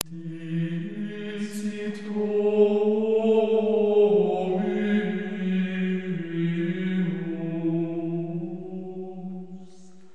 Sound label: chant, ambience